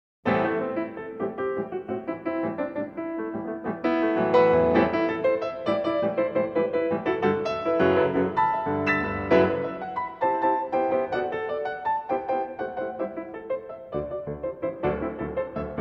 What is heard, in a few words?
Merengue